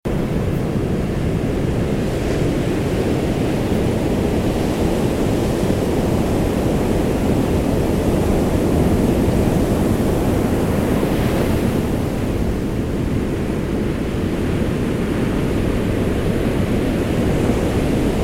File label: waves on beach